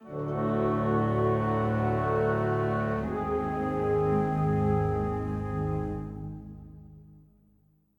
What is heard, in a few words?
Heist Music